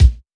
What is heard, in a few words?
rich mids bass drum single hit a sharp key